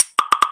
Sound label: slack new message sound